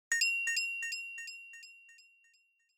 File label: Inspiration Low Delay